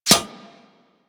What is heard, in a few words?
Arrow Impact Metal; Warframe; Weapons